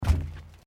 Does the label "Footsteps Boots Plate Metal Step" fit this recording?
Yes